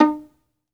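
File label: solo violin, Synclavier Strings; ILIO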